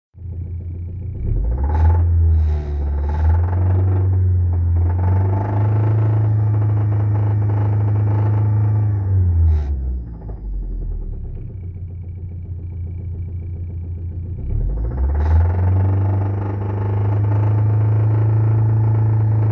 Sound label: put put clack whir knock sounds; saturn s series sedan